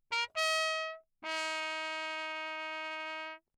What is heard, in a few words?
TRP MUTE Bach signal; D Wick; Perinétventiltrompete; Mutes